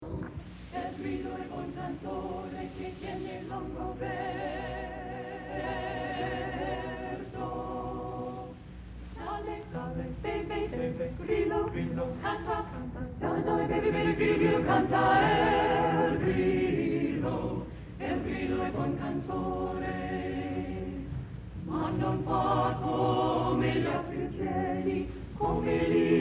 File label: Singers; Renaissance; El Grillo